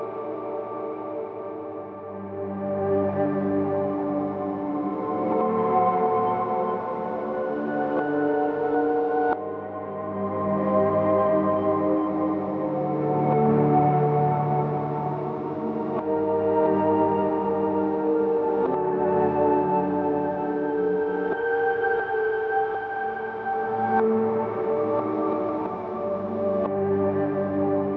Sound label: Loop 180bpm; jenny leclue detectiv